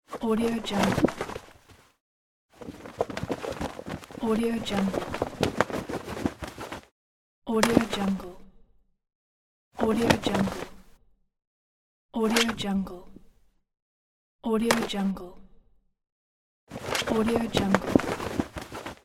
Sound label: cinematic backpack sounds